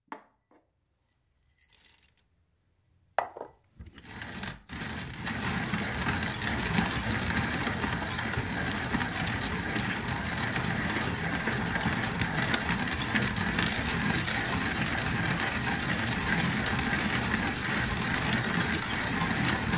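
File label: manual coffee grinder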